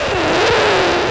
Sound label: X SCRA L, RECORD-FX; XP RECORD-FX; Best Service; XXL Production Effects